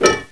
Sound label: pick hit; wanted; weapons